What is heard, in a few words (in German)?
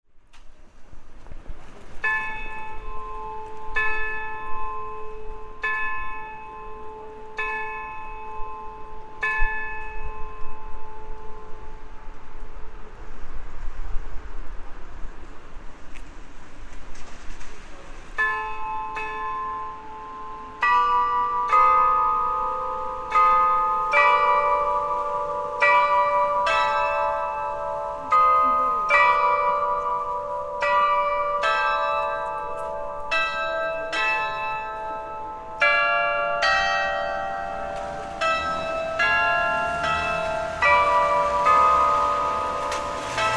glockenspiel